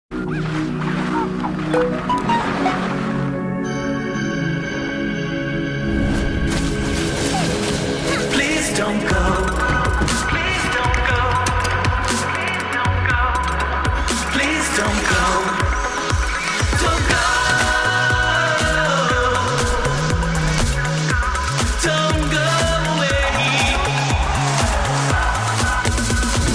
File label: Samsung Galaxy Z Fold5 Official; Samsung Galaxy Z Fold5 Official Film